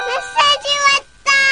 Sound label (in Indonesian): Nada Dering Pesan WA Korea